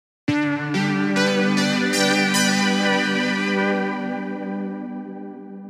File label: Synth W Bell, jup8